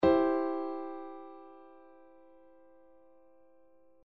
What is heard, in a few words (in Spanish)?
C 1era inversión